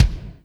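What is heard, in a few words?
kick Gtd Monstr, Alesis D4 drum machine; 300 Drum Machines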